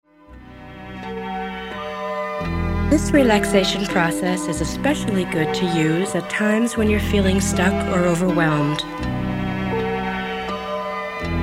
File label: Cleansing Breath